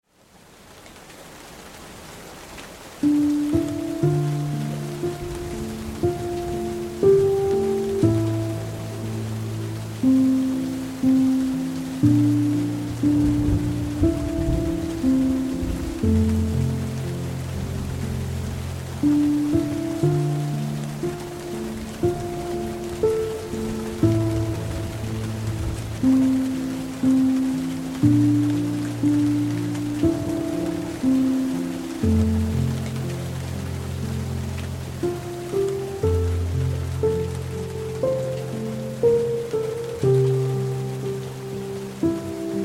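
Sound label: Lonely Ghost (Rainy Mood), violin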